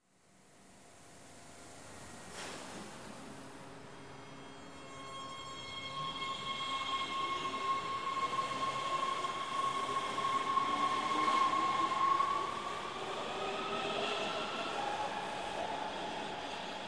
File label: Subway Away, subway